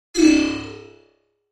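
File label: Electric Bounce Reverb, multimedia element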